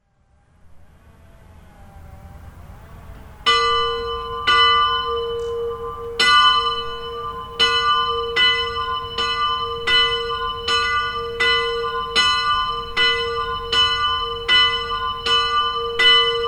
solos extérieur, cloches; Corbières

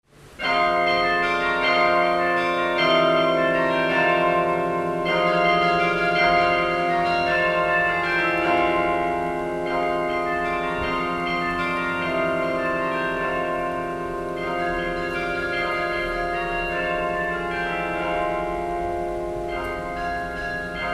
concert de carillon; CHAMBéRY

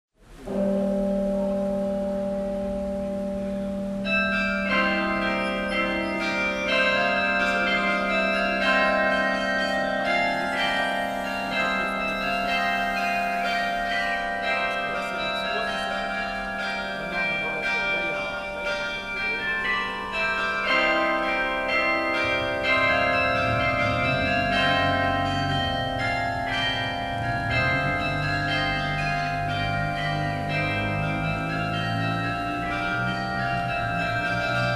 concert de carillon; CHAMBéRY

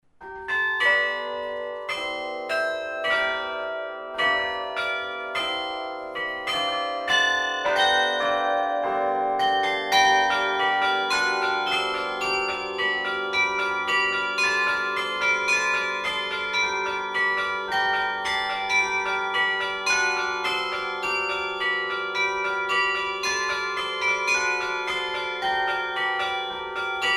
concert carillon; Taninges

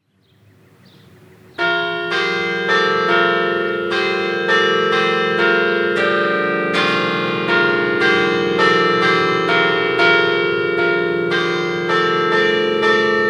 Siviriez carillon

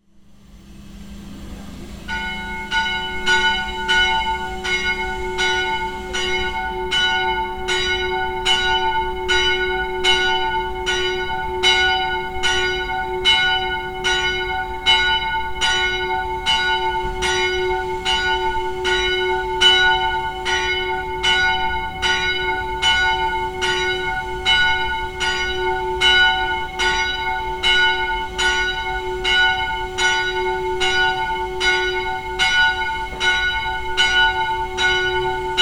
Sion cathédrale solos

